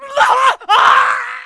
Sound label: death